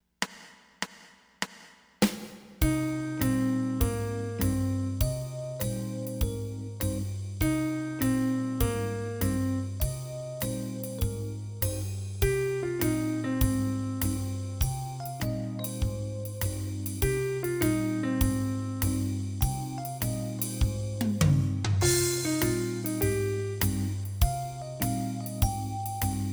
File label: Riffs